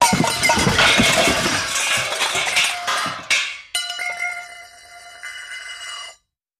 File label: Comical Crash Spin, crashes